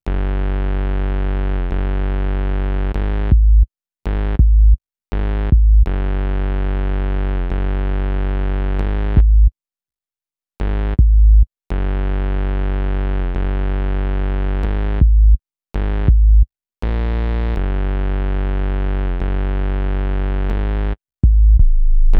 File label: Bass, Hip-Hop